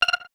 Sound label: Wireless Charging Started; Nothing Phone (2)